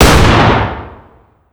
fire dist 12ga int

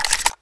shotgun insert; gmod; arccw uc commons attachments

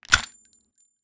revolver extractor; gmod; arccw uc commons attachments

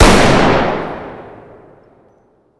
fire dist int shotgun; gmod; arccw uc commons attachments

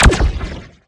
silenced sniper; armas; disparos; gmod; arccw kraken s republic essentials content

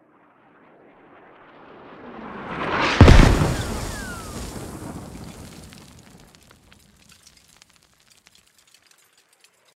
mortar impact 50m; gmod; better mortar

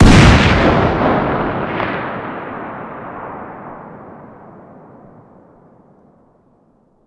fire dist 50bmg rif ext; gmod; arccw uc commons attachments

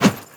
bat light; melee weapons; tfa starwars melee weapons pack

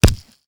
blunt light; tfa starwars melee weapons pack; nmrihimpact

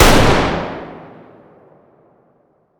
fire dist int rifle; gmod; arccw uc commons attachments

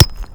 sand impact bullet; surfaces; tfa starwars melee weapons pack; nmrihimpact

